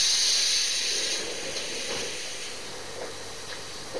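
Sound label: Door Close, Train; OpenBVE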